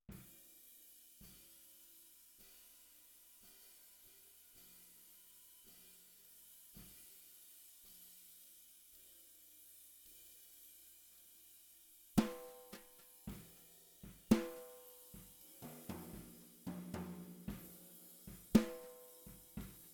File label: SNR